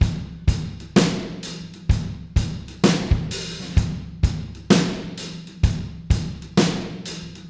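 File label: Beat Loops, 64 bpm; Buffalo; Zero-G - Monster Beats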